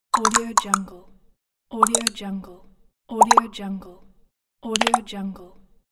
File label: infographic plop